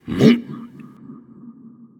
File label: nv grunt lift; cernunnos